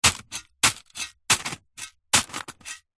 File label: industrial shovel space metal several digs into gravel stones; zapsplat